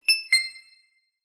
correct

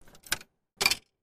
publictelephone money

